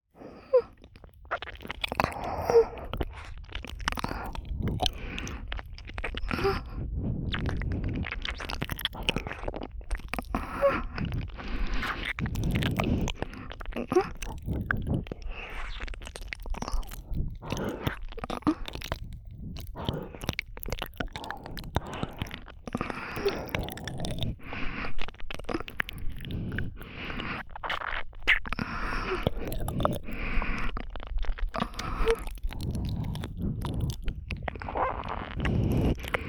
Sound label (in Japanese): (右)耳奥舐めねっとりエッチ, ASMR; 黒耳; 効果音無し版